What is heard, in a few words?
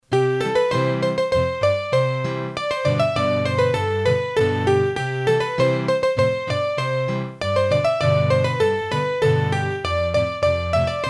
Scottische, hurdy-gurdy; Massif Central; Scottische de la Republique